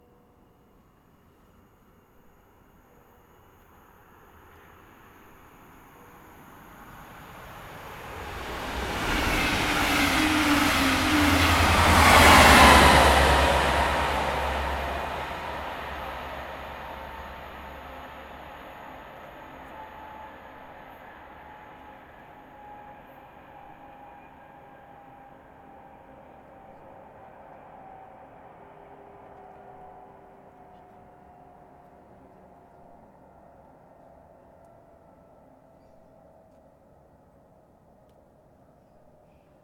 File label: Train Passing By